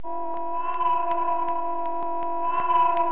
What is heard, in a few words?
scifi